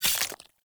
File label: Grunt Death Gore Medium; Sinecure; Melee Grunt; Enemy; Death Effects